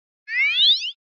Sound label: Comic Slide Short Going Up; Hollywood Edge; sound designer's toolkit v2